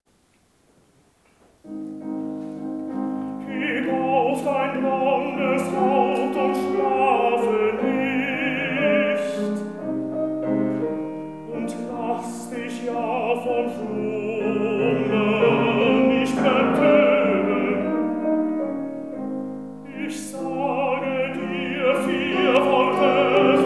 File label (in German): tenor, am Klavier; H.Wolf Heb' auf dein blondes Haupt und schlafe nicht; Claar ter Horst am Klavier